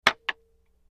Wood Staff Hits Multi

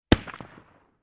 50 Cal Pistol Shot From Distant Point of View

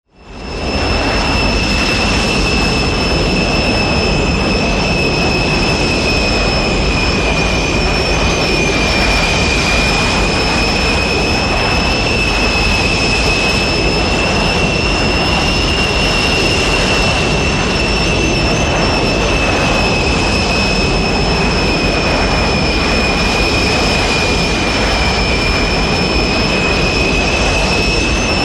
F8 Jet Exterior Very, f-8 crusader

